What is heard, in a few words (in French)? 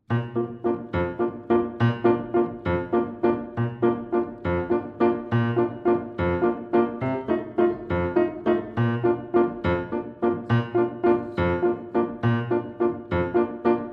instrumentale